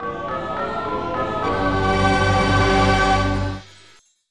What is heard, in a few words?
Important Item Get; Zool Majou Tsukai Densetsu; N64